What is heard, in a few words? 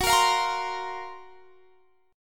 Gb Major Sharp 11th; GbM#11 strummed